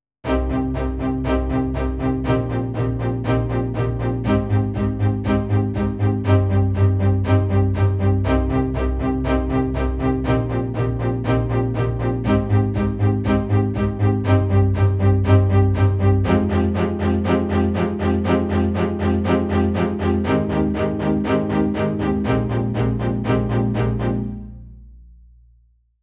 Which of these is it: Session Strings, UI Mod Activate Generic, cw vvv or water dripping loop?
Session Strings